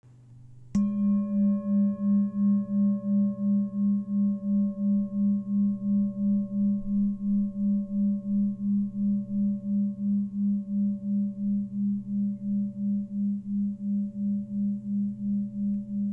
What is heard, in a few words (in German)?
Mondschale